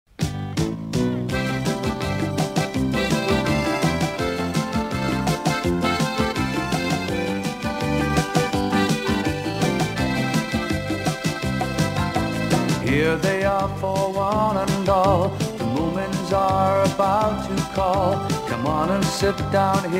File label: Opening Theme Song (English); The Moomins